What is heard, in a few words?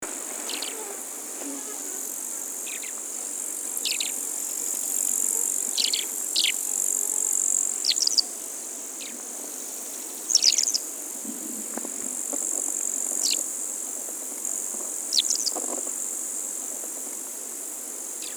suara call mongolian lark